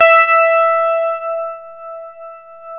GTR CH STRT, Clean E.Gtr Brite Strat

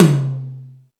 Ambient Rock, drum machine

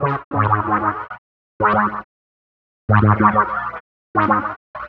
Wah Synth; Piano Stomp

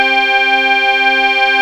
5THS WAVE

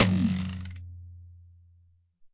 Rcht Tom, Alesis SR-18

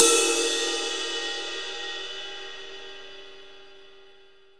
Percussion, Room; Giga Samples Collection

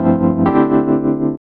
E.PIANO, KEYS

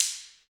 Afro Wood, Ambient Stix; Roland LCDP14 Africa VOL-2

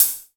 HHC VRB, Yamaha RY-10, Drums

